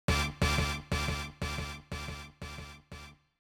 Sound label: brass